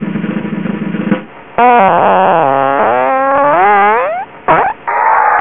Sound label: drumroll fart, farts